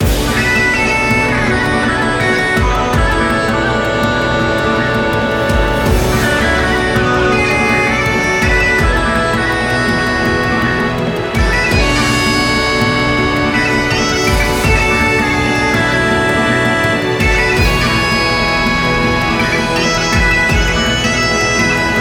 Bgm In Battle Wind; Yu-Gi-Oh Cross Duel; android

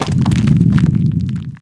Sfx Rumble

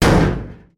metal hit

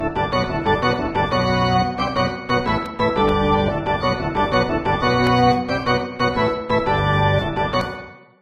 Ditty; All Star Baseball 97 Featuring Frank Thomas; PS1; Saturn

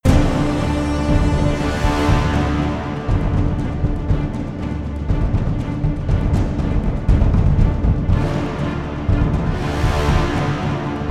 Credit Loop

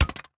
wood hit

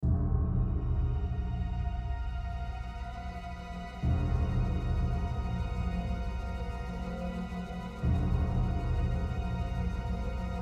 Ambient Idea Nonloop

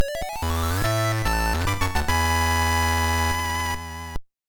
Level Up Fanfare Intro; Ganbare Goemon Mononoke Douchuu Tobidase Nabe Bugyou; GBC

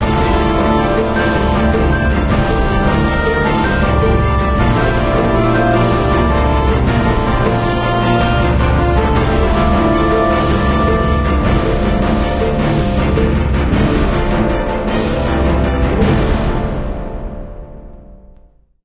Sting Greek Battle; Age of Empires Mythologies

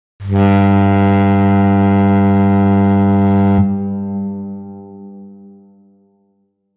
Boat Horn; HiberWorld; Android

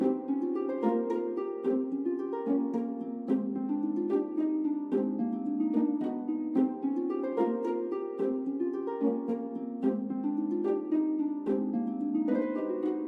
Garden ACTION+NOACTION+IDLE MELODY loop

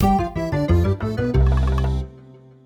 end stinger; SpongeBob Patty Pursuit; gloveworld

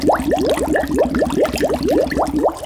Cursor Harvest; Levels Hub Swamp 03 Cursor Harvest; Bubbles

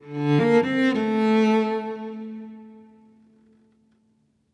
Music Level Fight Stinger; Krish

